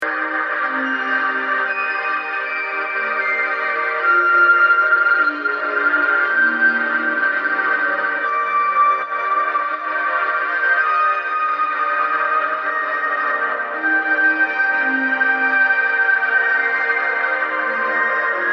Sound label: hidden filter